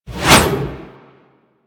Reaper Scythe Whoosh

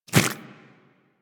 Infested Small Walk Default, Footsteps; Warframe

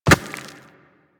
Walk Rock, Footsteps; Warframe; Operator

